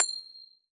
jackpot coin reveal

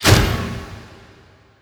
symbol appear hold

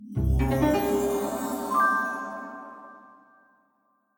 reelcover freespin start

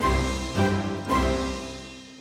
popup freespin end

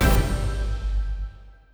symbol hit scatter

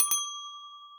maxbet

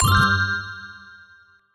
effect symbol jackpot move end

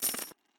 symbol win normal

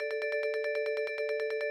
win normal coin count loop

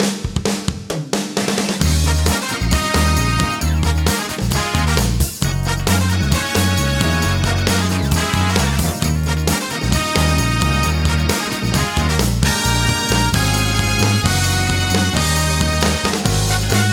big win loop

